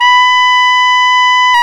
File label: SAX TENOR; Tenor V-sw